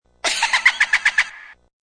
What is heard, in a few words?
Little Devil Laugh, Evil creepy high pitched devil's cackle; Devil Voices (Sound Effects:Horror)